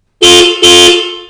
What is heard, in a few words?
Horn